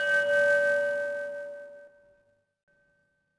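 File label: buoy bell; Splashdown; XBOX; Soultree; Venice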